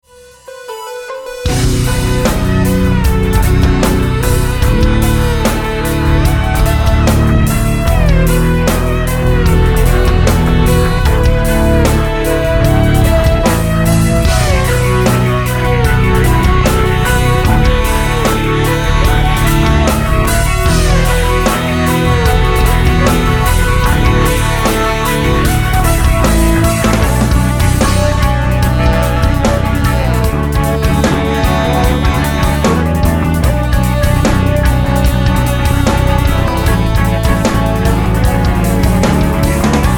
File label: Instrumental